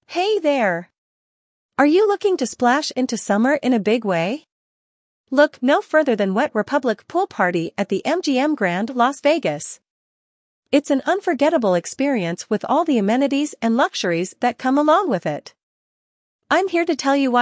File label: Pool Party; Wet Republic; MGM Grand